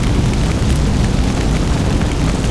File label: fire large